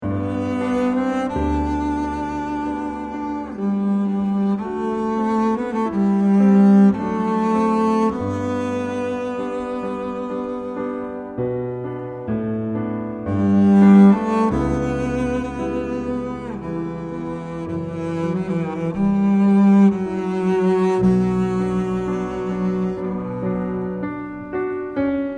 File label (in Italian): melodia, midi